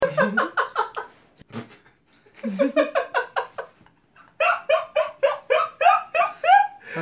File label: laughing